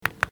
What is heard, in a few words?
click button; puzzle-quest